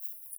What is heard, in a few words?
Myotis dasycneme; europe